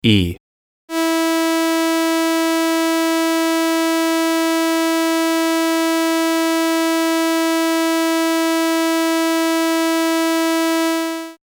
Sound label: flute clarinet, jargon wind tunings